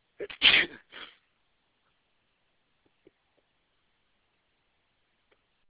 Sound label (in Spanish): estornudario, male